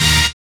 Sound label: SYN, dance; Sound & Vision - Gigapack II